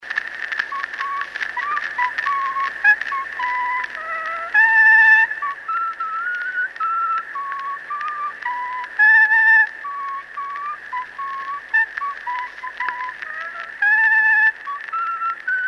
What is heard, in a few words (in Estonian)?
Kuusalu lehepill folk; Oh ütle armas neiuke; Tõnu Sestverk